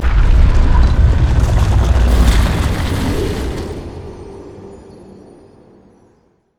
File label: viking shop close